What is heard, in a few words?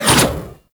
Scythe Spin Whoosh; Reaper